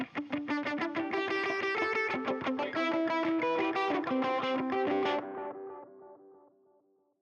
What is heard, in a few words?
drop, Gitarren